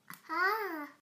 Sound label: aah